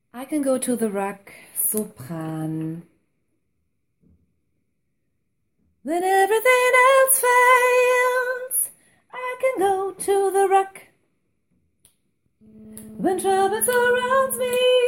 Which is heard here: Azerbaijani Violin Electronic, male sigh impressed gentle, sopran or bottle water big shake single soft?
sopran